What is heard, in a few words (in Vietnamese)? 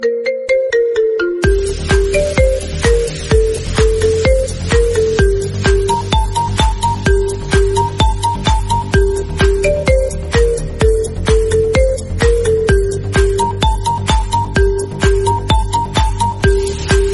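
nhạc chuông điện thoại marimba iphone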